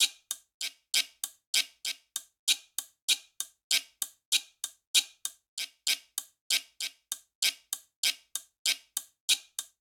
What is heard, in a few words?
Danzon Guiro-130bpm; Latin Percussion; Ableton; Factory Packs